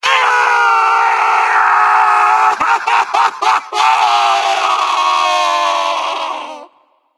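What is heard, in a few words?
nonverbal fear; smite; voicepack; merlin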